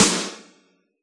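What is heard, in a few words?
snare drum electronic; Yamaha DJX; AnlgKit1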